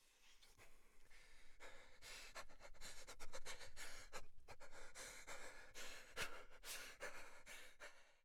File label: Voices Breathing; The 39 Steps